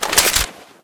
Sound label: pistol draw; weapons; deluge